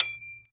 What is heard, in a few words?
DROP SHINE